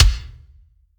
soft hit normal

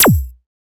nyoom

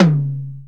TOM; osu

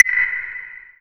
drum long med finish

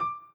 chord in d

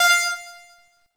normal hitwhistle

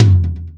TOM

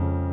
piano low

